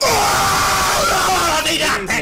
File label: death sound; butcher battler; battle bricks sfx